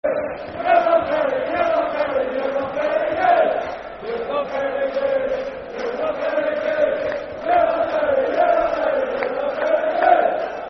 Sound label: Football Chant (Soccer Song) N. Ireland; You're Not Very Good; N. Ireland N.i.f.a